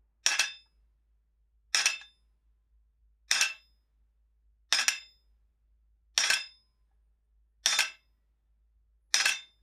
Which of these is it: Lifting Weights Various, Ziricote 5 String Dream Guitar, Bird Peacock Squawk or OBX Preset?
Lifting Weights Various